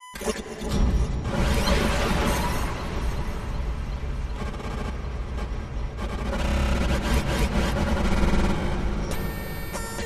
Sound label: Impact Tweaks Metallic